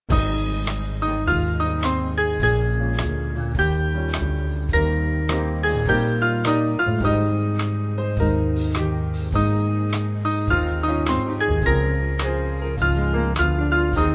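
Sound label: band, Music Backing Files for Churches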